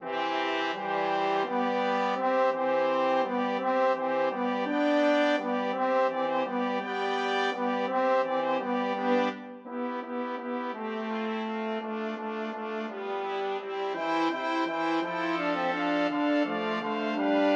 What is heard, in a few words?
Hornpipe; Handel